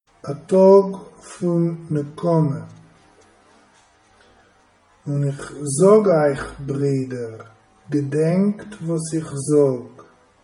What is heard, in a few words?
A tog foen nekome 4 coupletten